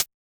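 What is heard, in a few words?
Hi-Hat FX Closed; Yamaha DJX-2B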